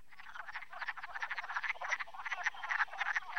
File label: wood frog